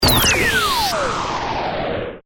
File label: Stinger Hit, Production, Element, Stinger, Hit, Electrical, Swirl, Power, Off